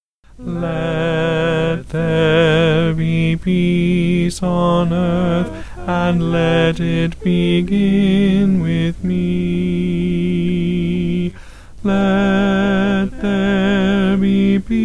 tenor

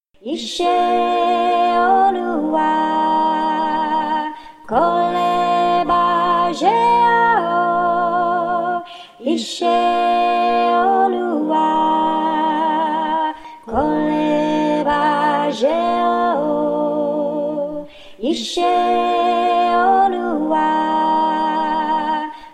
soprano; Ise Oluwa

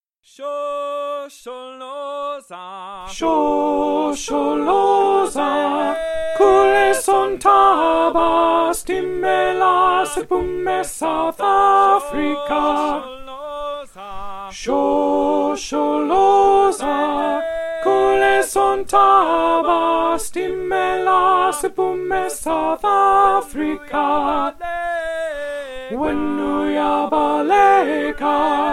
Shosholoza alto

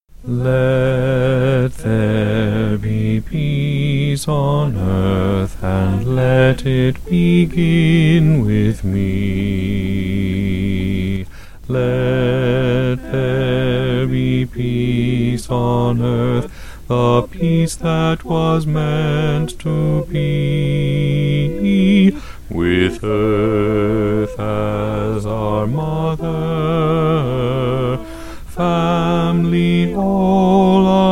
bass

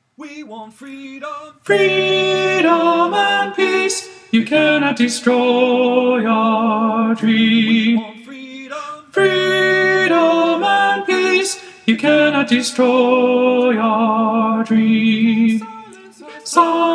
Alto

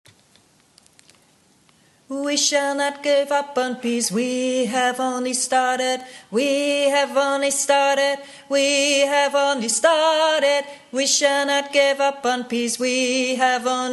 We Shall Not Give Up On Peace Tenor, peace choir

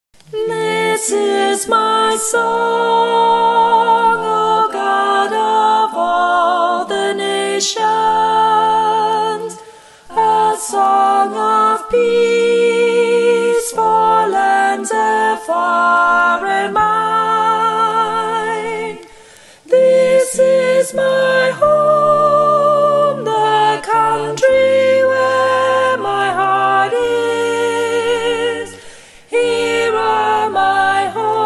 soprano

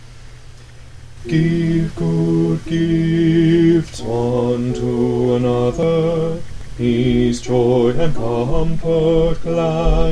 Give Good Gifts bass, choir

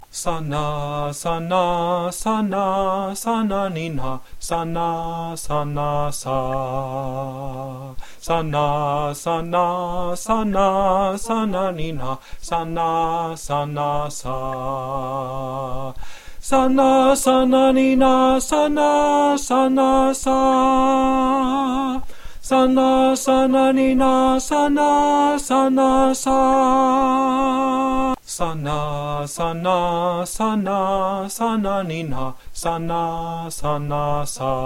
tenor

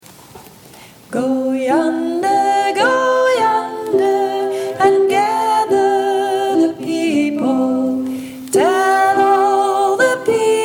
soprano

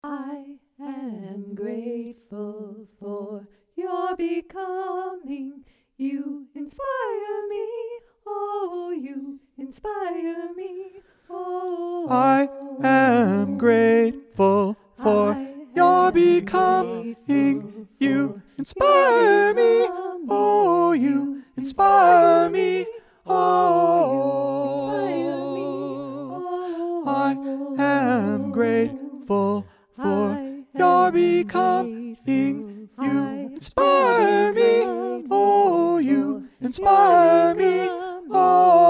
Tenor

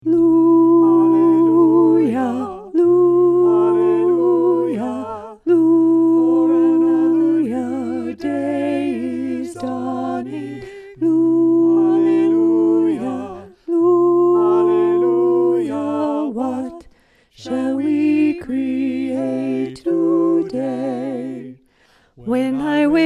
Alto; 2023 Fall Repertoire

